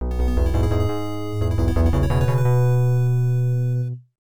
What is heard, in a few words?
Clear Fanfare; The Return of Ishtar; Fujitsu FM77AV